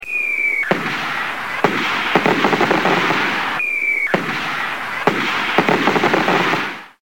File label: Fireworks